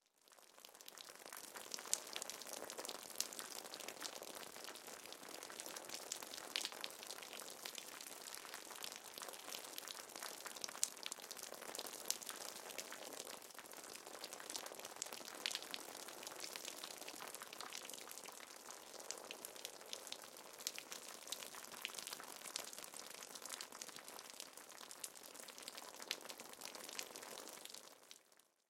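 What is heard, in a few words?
water sounds; zvuki vody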